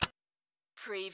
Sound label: real click